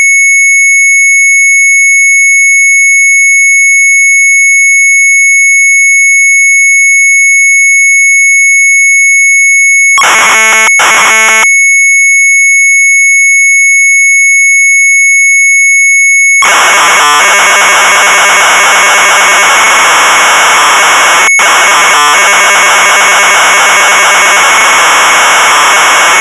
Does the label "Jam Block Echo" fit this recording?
No